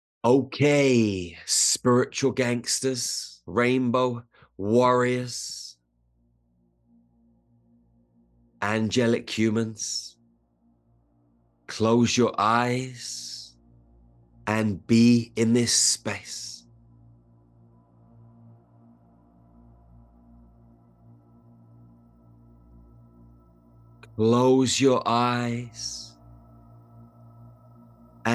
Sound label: rainbow waterfalls; weekly med connect